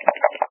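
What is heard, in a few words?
dice shaken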